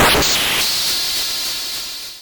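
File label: explosion long, Retro Game Weapons Sound Effects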